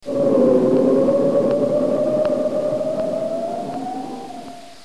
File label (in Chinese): 电视包装音乐